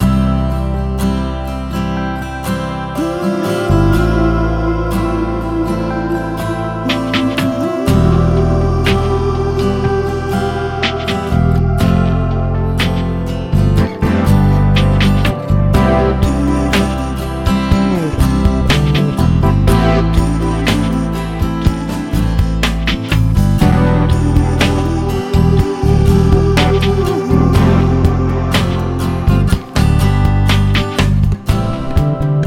Dreamy beep

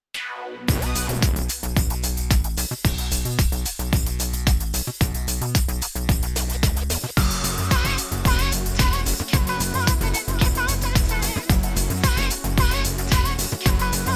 mix music

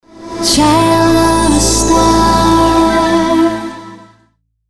Soundlogo

